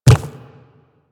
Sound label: Quadruped Medium Walk Default, footsteps; Warframe; Infested